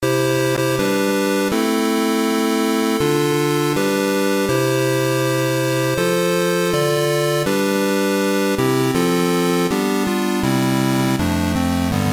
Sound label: square wave; Sistemes Digitals